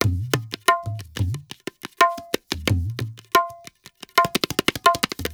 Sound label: ETHNO EXTRA 90; ethno2